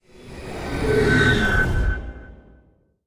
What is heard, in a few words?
death tail; smite; chronos